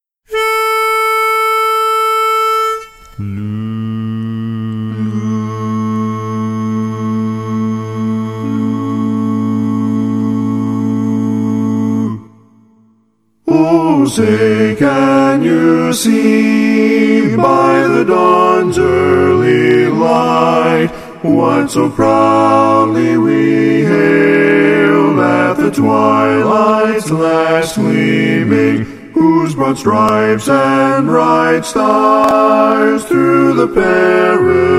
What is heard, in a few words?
Bari missing